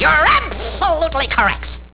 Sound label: correct